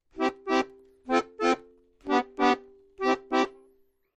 Accordion Happy Rhyt; keyboards

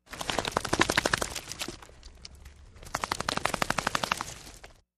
Wet Dog Shaking Twice; mvmt

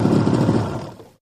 150 hp Mercury Boat Shut Off

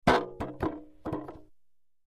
Wood Shield Falls Woo, shields

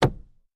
Carriage Footplates

